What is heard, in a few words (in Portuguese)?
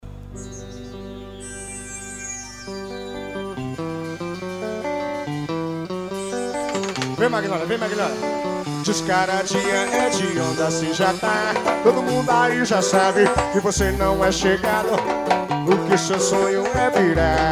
pagode samba